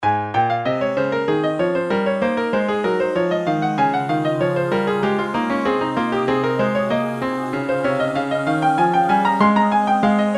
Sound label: Piano